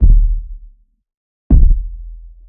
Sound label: Thump Bass